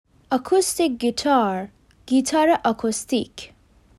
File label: acoustic guitar